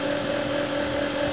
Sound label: fan, Altair32 Emulator; MITS; Altair32 Emulator for Windows v3.20